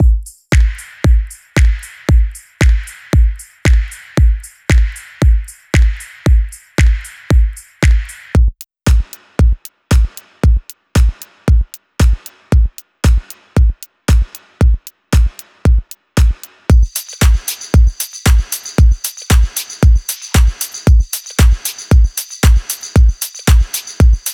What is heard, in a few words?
chill house